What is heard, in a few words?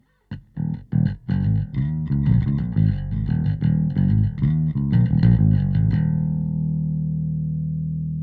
Slap Bass; bass preset